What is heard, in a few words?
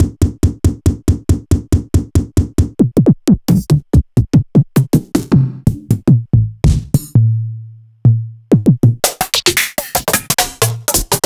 Korg Triton BD & SD kit; Sliced